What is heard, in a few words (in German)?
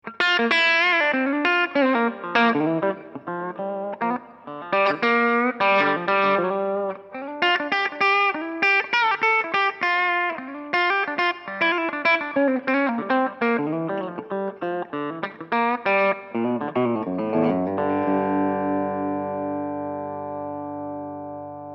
clean steg